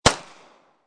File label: M9 9mm Barrel; Pistols; Guns; VRSonic